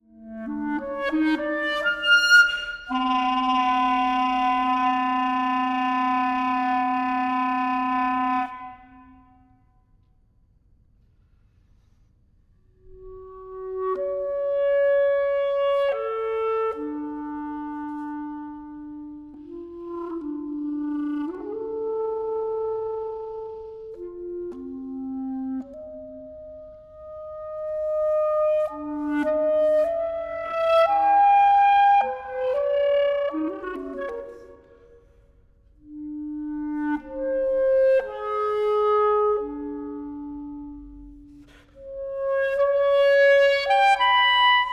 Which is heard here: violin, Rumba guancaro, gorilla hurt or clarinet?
clarinet